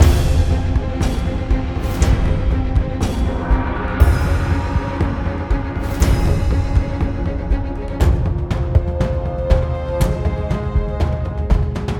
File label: String; Assassin's Creed Unity Dead Kings